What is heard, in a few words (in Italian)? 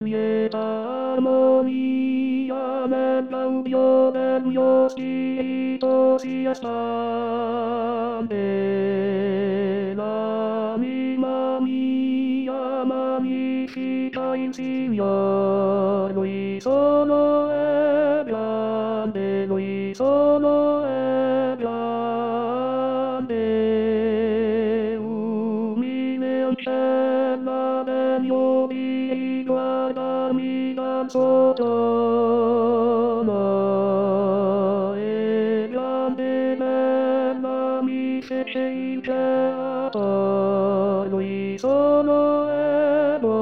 Lieta Armonia Tenori